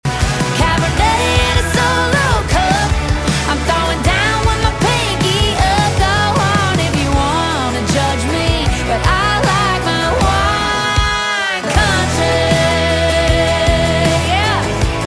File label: radio hook; winecountry